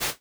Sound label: rustle